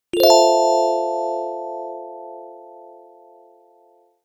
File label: joyous chime notification; jingles and logos